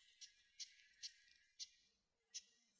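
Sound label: Japanese bush warbler call note; uguisu jinaki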